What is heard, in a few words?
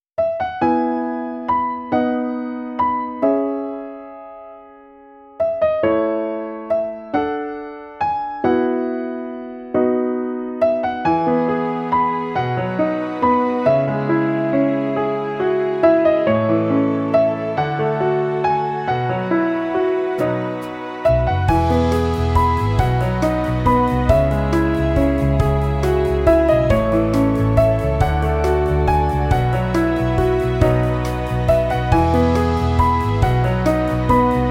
BPM138